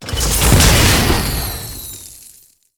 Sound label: supply opened; cstrike; pubg